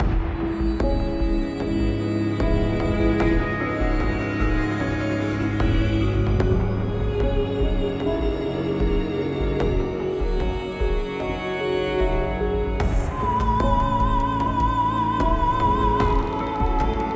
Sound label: KONTAKT THIRD PARTY SONG FULL